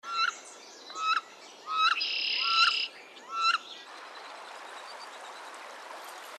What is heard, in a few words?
Wood Duck